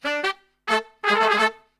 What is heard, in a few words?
SOUL G -A, Brass, SOUL LICK; Best Service; Brass Super Section